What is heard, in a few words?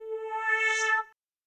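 synth electro 140 A; Techno; Keys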